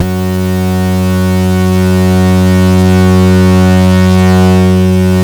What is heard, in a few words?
ACID CUT BASS; Masterbits; Vintage Voltage